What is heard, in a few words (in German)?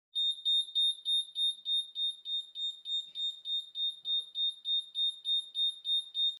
Piep